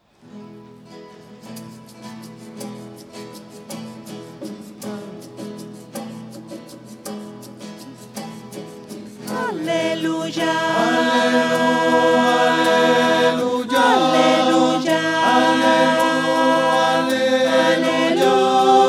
voces; aleluya en mi; misa

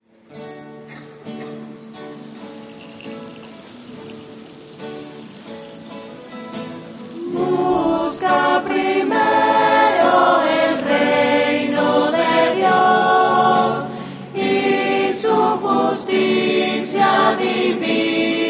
voces; misas 2012

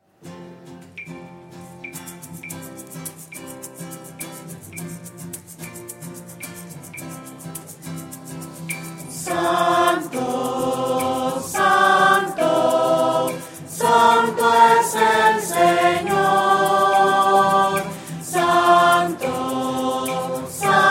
voces; misas 2012

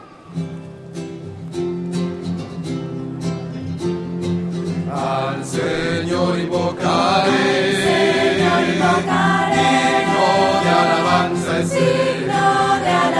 voces; al sennor invocar; misas 2012

